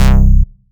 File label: Basses Root C